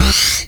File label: STAB